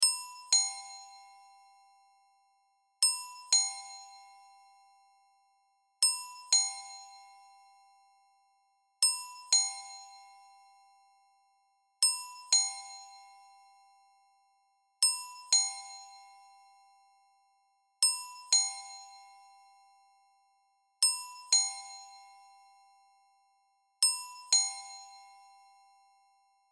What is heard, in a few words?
Ding